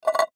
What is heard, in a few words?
Tin Can Set Down, Tin Can Set Down Wobble Empty; Objects; Foley; Blastwave